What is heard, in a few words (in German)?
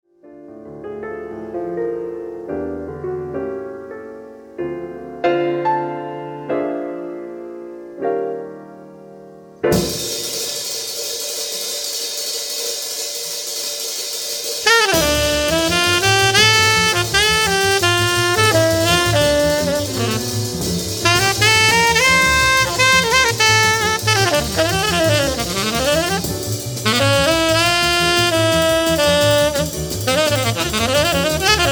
Saxophon